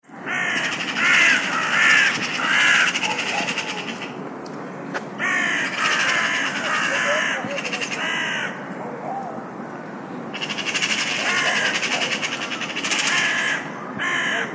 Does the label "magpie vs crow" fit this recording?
Yes